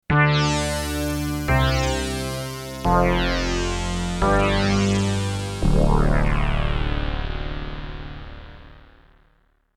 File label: MKS-80 Dual Stack